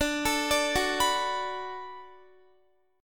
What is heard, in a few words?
D 6th D down Guitar, chord; D6 arpeggiated; tuning 4E,4A,5D,5G,5C,6F